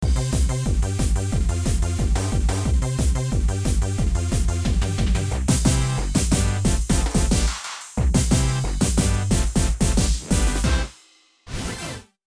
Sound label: Remix Tempo Up